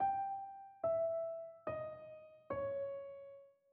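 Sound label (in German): Tetrachord Span1 g ab, spanisch; Tetrachorde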